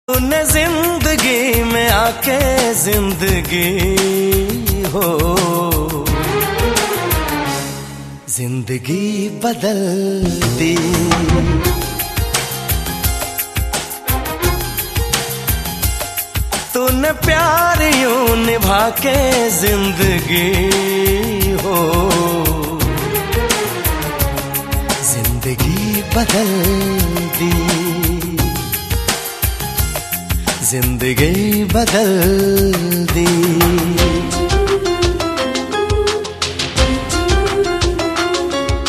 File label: Mobile tone